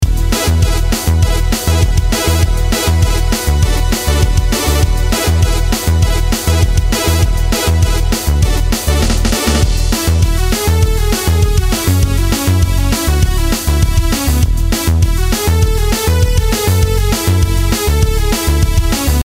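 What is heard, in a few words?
Pacman Title Loop, music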